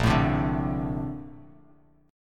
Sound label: Bb Major 9th Bb down Bass Standard, BbM9 chord; strummed; JGuitar